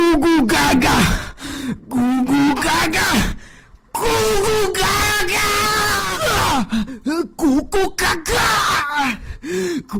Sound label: goo goo ga gaa; thukuna